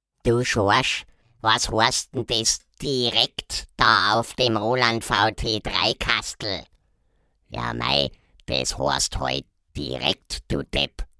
direct

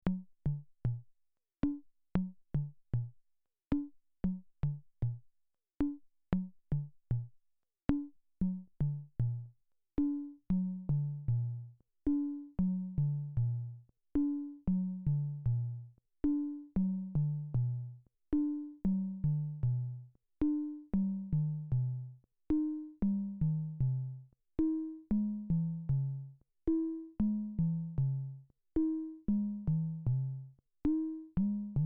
Disco Toms

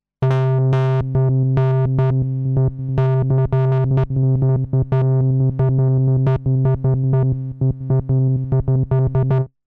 RND MOOG

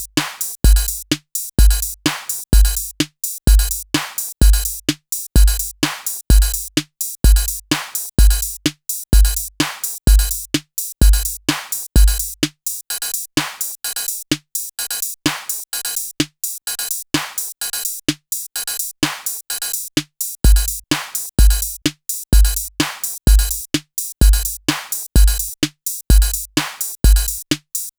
Drum Samples Overdub

